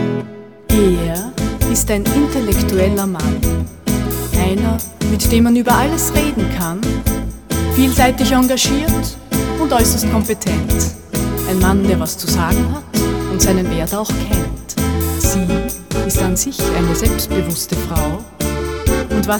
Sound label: tango